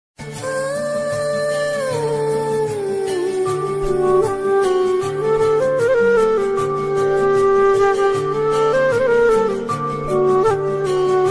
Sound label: love ringtone tamil love ringtone love bgm ringtone lofi; varuthapadatha valibar sangam